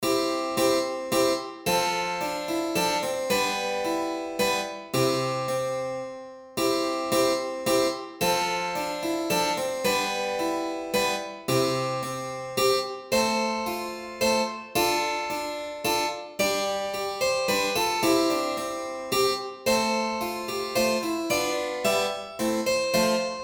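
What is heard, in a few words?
ave maria pythagorean, tuning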